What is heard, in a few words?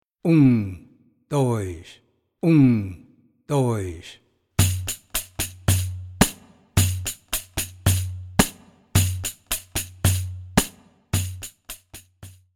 Playalong Standard